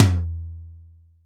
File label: Ambient Tom Drum Single Hit F Key, Royality free tom one shot tuned to the F note; tom drum samples